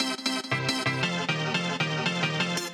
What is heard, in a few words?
ravestab drop riff; 'Effection' samples; lolicore